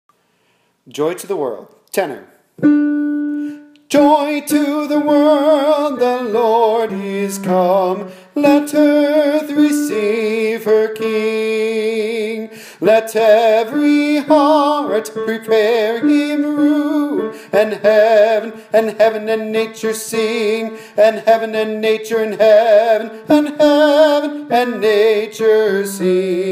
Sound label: tenor